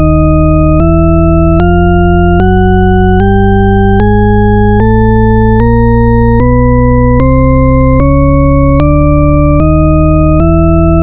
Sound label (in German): Shepard Tonleiter Aufsteigend